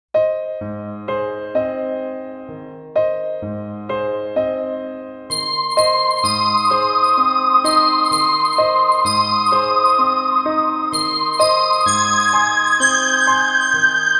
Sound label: Brahms Lullaby Slow; gekiban